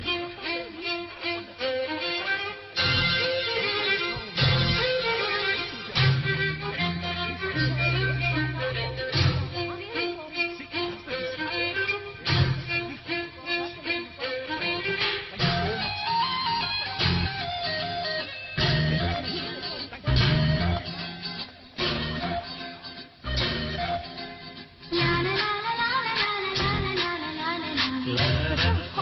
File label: background music, south